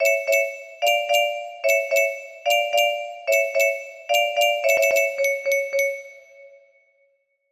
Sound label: basic box, music box melody